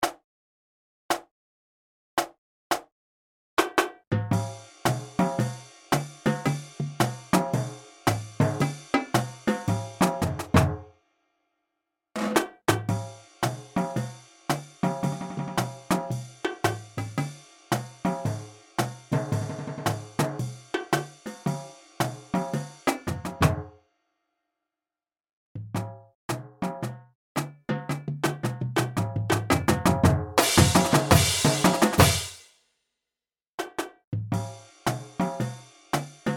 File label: percussion only